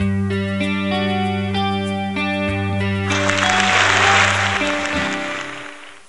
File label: Ceremony Ring